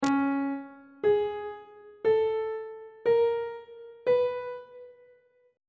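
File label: d flat diminished 6th minor 6th major 6th augmented 6th intervals on treble clef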